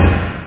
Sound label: hammer; Amoszine 5; TILE GAME